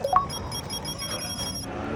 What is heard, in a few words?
timer up